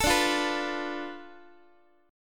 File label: D Minor 6th Guitar; Dm6 strummed; JGuitar